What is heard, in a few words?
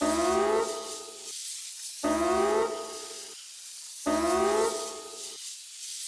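emergency mode loop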